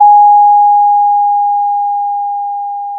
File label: pop, Sound of a school bell